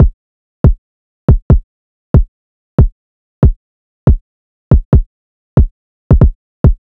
Drum Loop 140 BPM Kick, Hip Hop; Drum Loops; Loop Stems; Cymatics; Carota

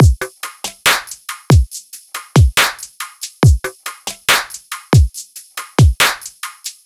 Boombox Full Drum Loop 140 BPM, EDM; Drums; Loops; Cymatics; EDM Starter Pack

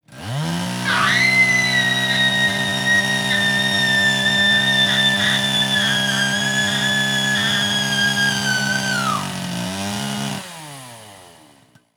chainsaw attack, Cartoon Film Effects

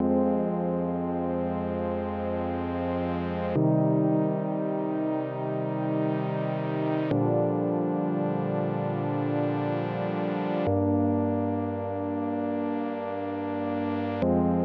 135 BPM C Min Pad, Hip Hop; Melody Loops; Loop Stems; Cymatics; She Goes

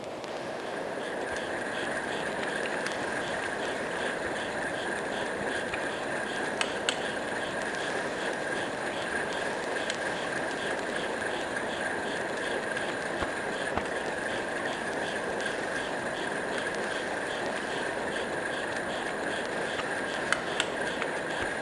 Trap Ambience, Background Ambience

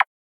Perc; Dimensions; Cymatics; Mystery Pack Vol 4; Drum One Shots

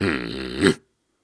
Snarls and Hums, Viking Vocal

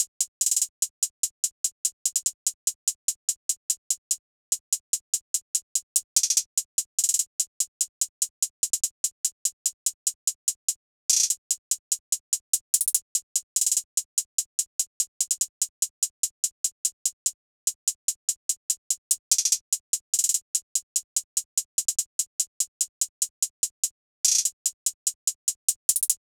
Hihat Loop 146 BPM; Bands

